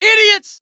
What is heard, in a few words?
jeers; tf; cj